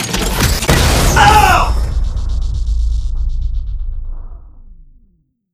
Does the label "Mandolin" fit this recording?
No